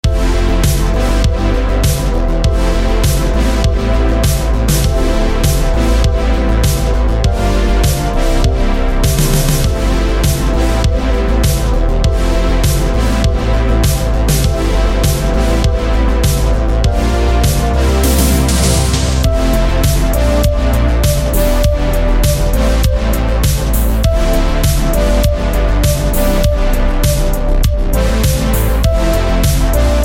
techno vibes; Terraria Spirit Mod

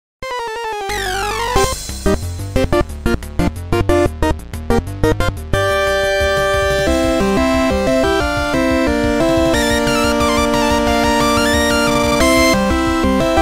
Battle!; Rotom

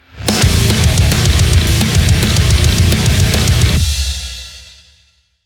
transition; Doom

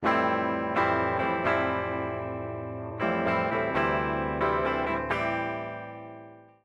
Splash; adastra 2018

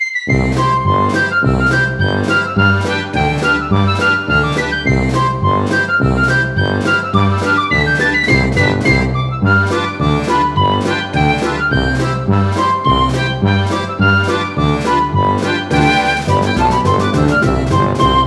Pirate, reveal music

